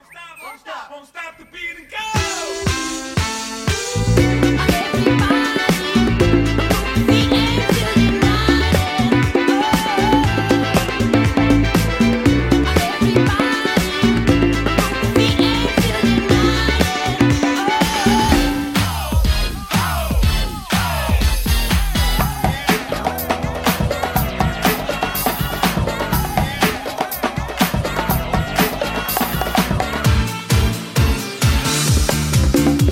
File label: drum n decks solo, DJ & Live Percussion, Sax & Female Vocals